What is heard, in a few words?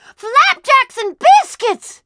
Ambient